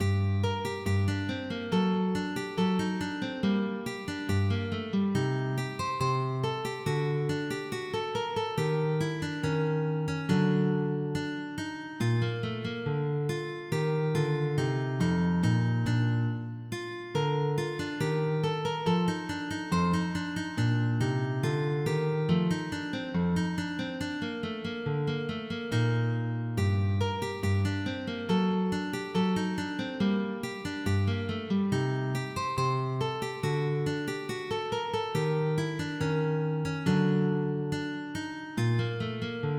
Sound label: Grave per l'arciliuto, MIDI